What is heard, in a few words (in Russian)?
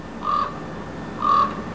Карканье ворона